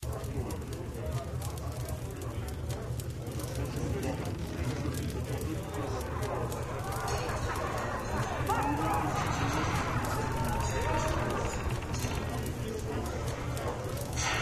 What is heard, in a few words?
City Tavern Amb